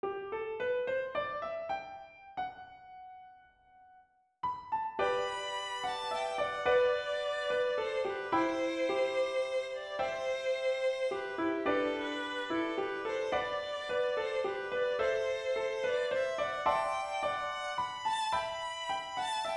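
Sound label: waltz, melody and 3 5 harmony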